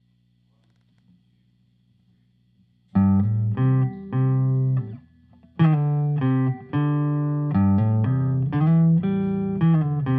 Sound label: Solo SM58